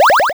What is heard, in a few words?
powerup; SpiderCut